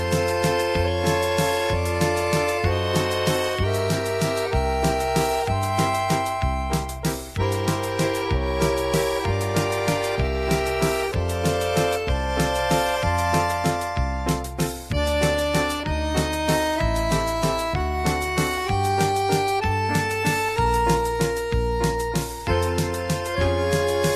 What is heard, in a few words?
french accordion waltz